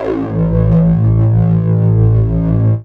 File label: dance, bass; Techno Trance Essentials